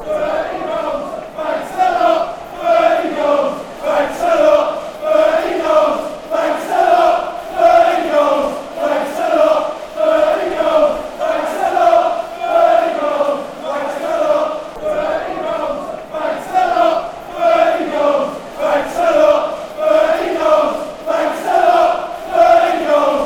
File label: thirty goals fanchants